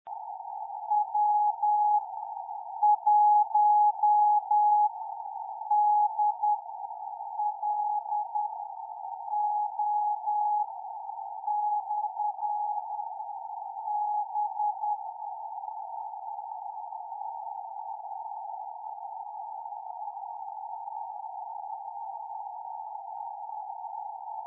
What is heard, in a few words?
beacon; w1dlo; sv1atj